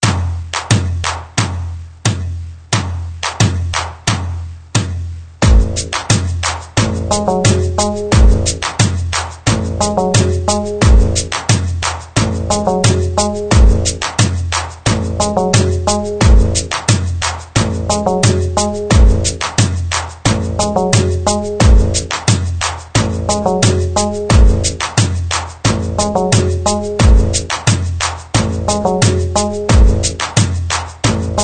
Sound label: beat